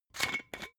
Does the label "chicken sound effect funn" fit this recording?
No